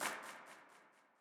Ground Hard Hit Small; FieldBattle

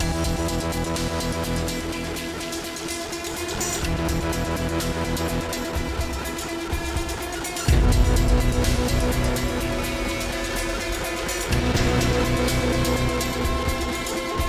Mus Phrase Short 125bpm; Battlefield Hardline; ps3 ps4 windows xbox 360 xbox one gamerip 2015

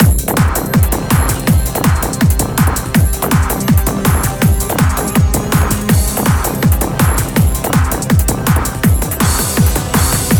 BGM Ingame

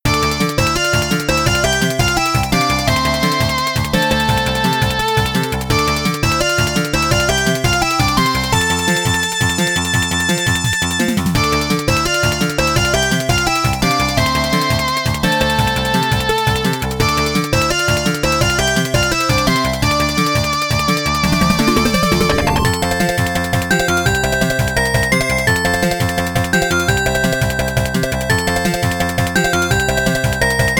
Fair Wind; Ys I & II Chronicles; PC-88 mode

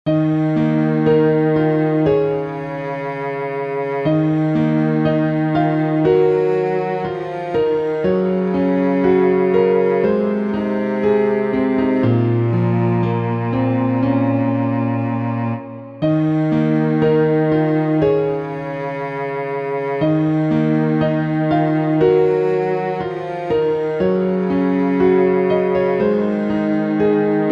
The Last Day of the World (Piano & Cello)

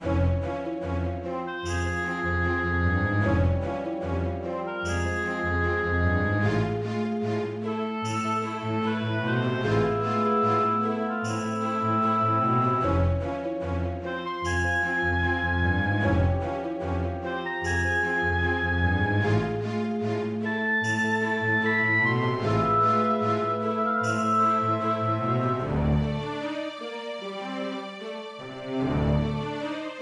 Wobble; Ogre Battle 64 Person of Lordly Caliber; N64

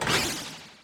Throw; Super Mombo Quest

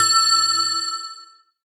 Text Feedback

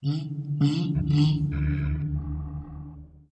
whale; GONNER

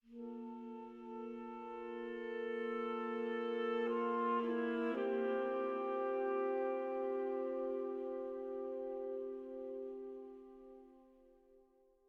Env Sky High Altitude Melody; the legend of zelda tears of the kingdom

